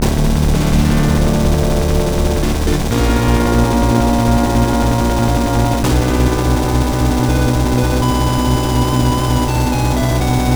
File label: Jingle - Gekishin [Jingle]; Shining Force Genesis